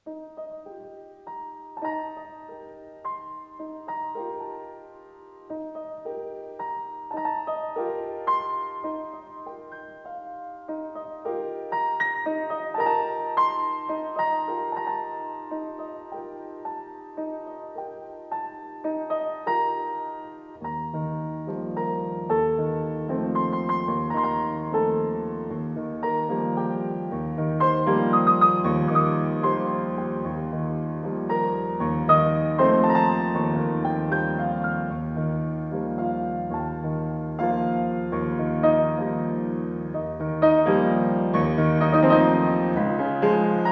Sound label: waltz